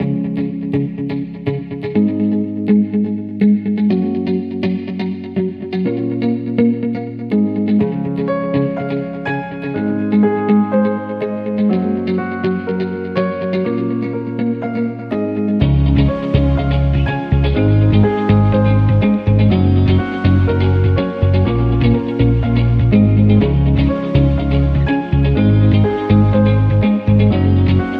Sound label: Positive Team Motivation, No Drums